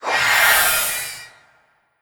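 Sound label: Animal Death voice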